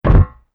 walk metal; gamerip; shattered horizon 2009

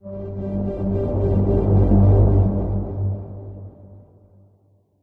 Transition

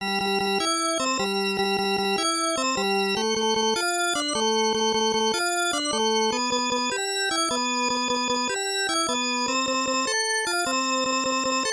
Sweet Sweet Sports; Pro Yakyuu Fan; PC-8801; TELENET MUSIC BOX ノーマル音源版

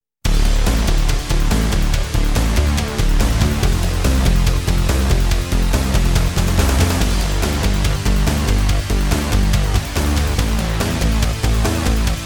Level Boss; panzer dragoon remake ps4 stadia switch windows xbox one gamerip 2020

